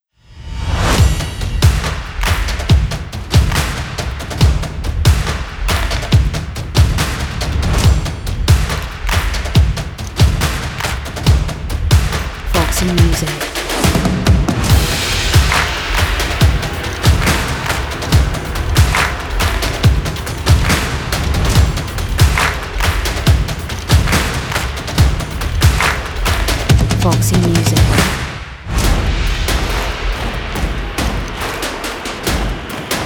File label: Stadium Drums; Foximusic